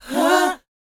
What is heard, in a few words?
BOYZ C#, Vocal Planet R&B & Dance; ILIO